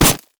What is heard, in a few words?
jester basic attack; LoL; shaco